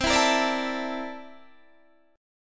C 13th C down Guitar Standard; C13 strummed

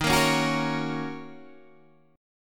D sharp Minor 7th, Guitar, DADGAD

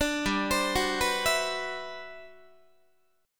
G Major 13th, Guitar; Listen to GM13 arpeggiated; GM13 Chord

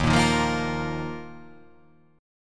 D sharp Suspended 2nd

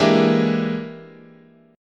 E 7th Sharp 9th Flat 5th, chord

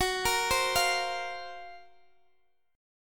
F sharp Major 7th Flat 5th F sharp, Guitar Open D

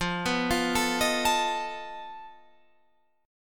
F 7th Flat 5th F down

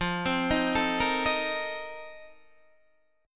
F 11th, Guitar, DADGAD

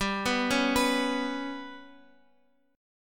G Major Flat 5th G down Guitar Open F; GMb5 arpeggiated; JGuitar

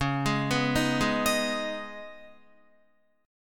Db 7th Suspended 2nd, Guitar; 8,11,11,8,8,11; Guitar-4F,4A,5C,5G,5C,6E; Db7sus2 arpeggiated

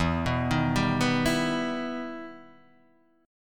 E Minor Major 7th E down; EmM7 arpeggiated

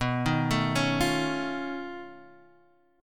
B 7th Sharp 11th, guitar; arpeggiated

